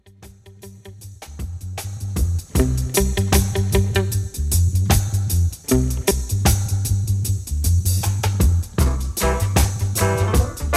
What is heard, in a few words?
African Dub